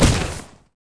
Drop Arrow

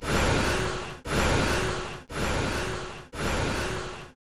Magic buff

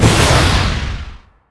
Impact

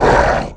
Attack Voice

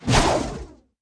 Whoosh

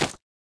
DROP Knee

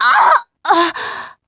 MON DIE

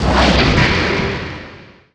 close

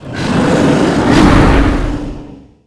Charge Attack

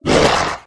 Attack

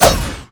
Attack

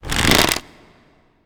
Bow ready

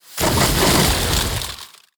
Ice Stome Area

